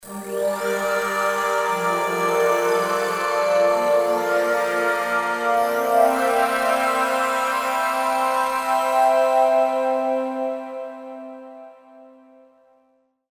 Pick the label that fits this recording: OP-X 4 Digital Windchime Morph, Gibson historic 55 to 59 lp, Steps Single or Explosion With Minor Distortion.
OP-X 4 Digital Windchime Morph